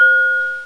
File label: Tinkle Bell (C4); X-Wing; X-Wing Patches